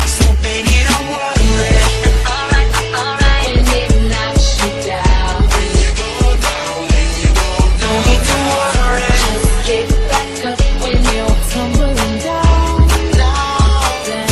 pop remix

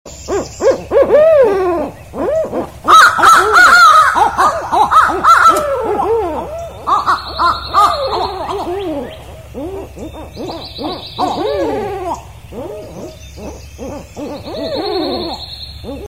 Cuckoo Cuckoo

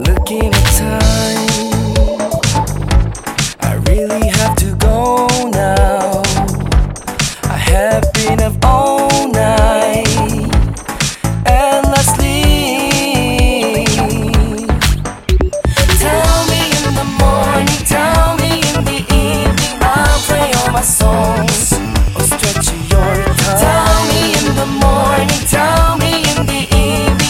electronics

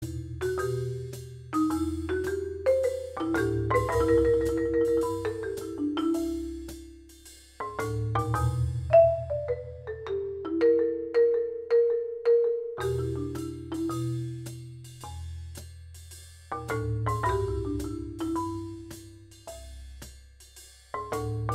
Theme Marimba cover

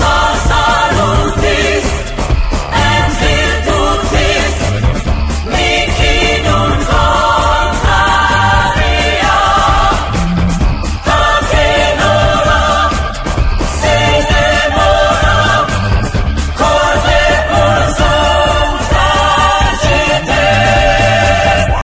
clasical

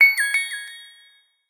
message tone

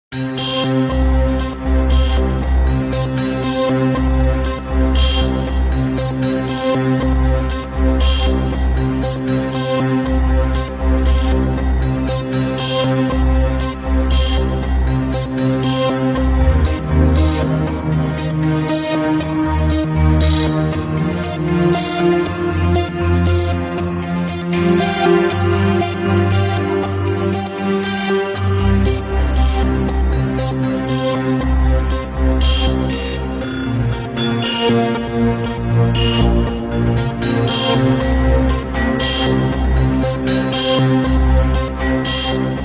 Dramatic Background

